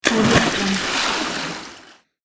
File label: water splash